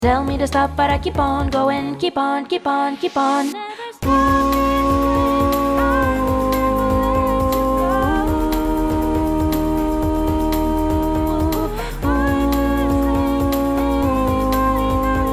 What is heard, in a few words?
Alto, ensemble vocal pop rock; ensemble vocal pop rock de larquemuse